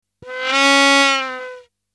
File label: Aliens Monsters; FXHome